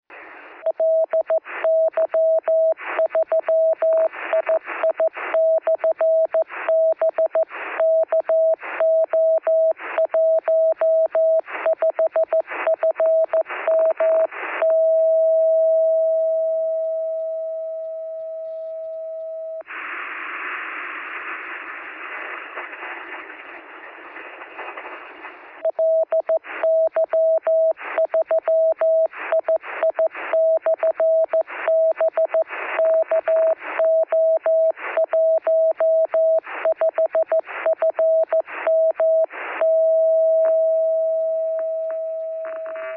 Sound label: 23cm beacon